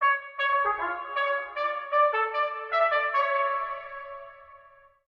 trumpet

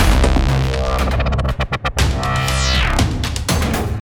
Taunt; Skyway

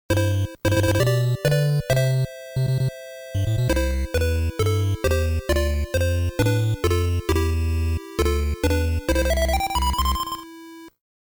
Boss Round Clear; Game Sound Museum Special Edition; UG Quinty 2004

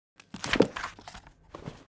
Get Letters

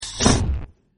Trap

